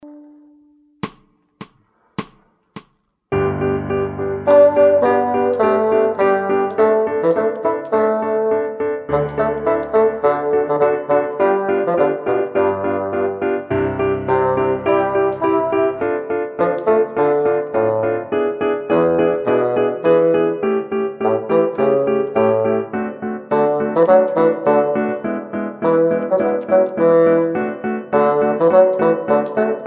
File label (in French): Basson